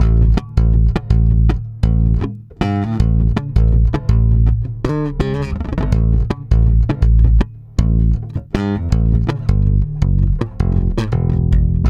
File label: AL STRUM B, bass; Spectrasonics; Bass Legends; 88 STRUMMIN